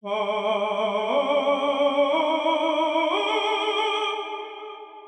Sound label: tenor